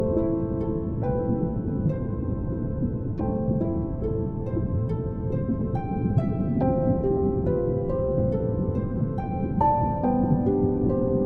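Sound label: Music for concentration soft sound, sounds of concentration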